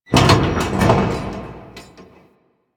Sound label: Large Vehicle Creak; Warframe; Ambience; NineteenNinetyNine